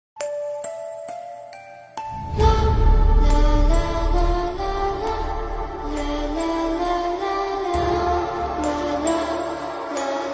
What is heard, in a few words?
horror movie bgm; munjya movie